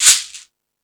Timbale, E-MU Pro-Cussion; drum machine

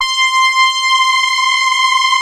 FAT LEAD, Powered DX; Wizoo

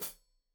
Hat Foot, Hi-Hat, Cymbals Stereo

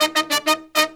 Pop & Funk Brass, 096; Best Service ProSamples vol.25; AKAI

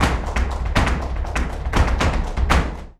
BULERIAS TAP, Flamenco; Best Service ProSamples vol.46; AKAI

